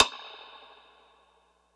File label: stick; eddyflux